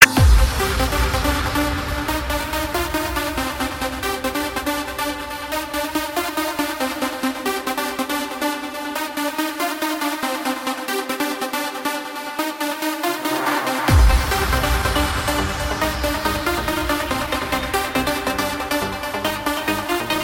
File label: Trance